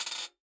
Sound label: Drum C-Hihat Buzz